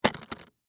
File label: Case Latch Open, Foley, Case, Latch, Open